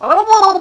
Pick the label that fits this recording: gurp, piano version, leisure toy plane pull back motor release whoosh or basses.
gurp